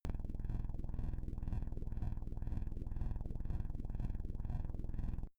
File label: space inside biomedical alien shuttle low pumping